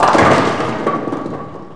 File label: 10 PINS, BOWLING